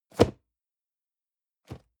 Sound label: cardboard box medium place; objekte